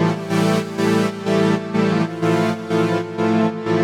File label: Pad DS201 125 A, sidechained